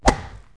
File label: hit ball, sports; CD Mania